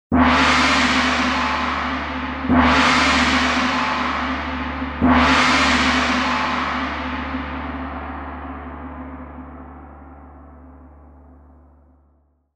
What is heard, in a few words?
gong in c three times